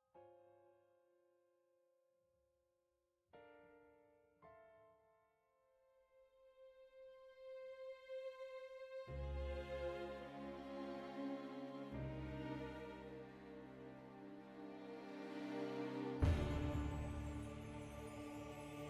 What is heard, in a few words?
global music; Dynamic Ambience And Music